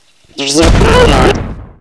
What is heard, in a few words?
pain; Alien Arena; droideka